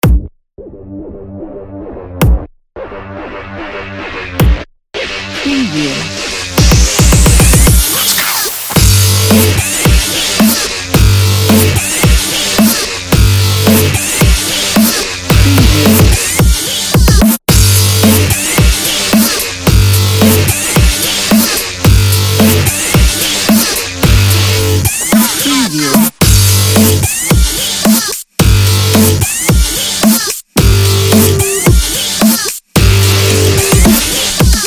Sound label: dubstep opener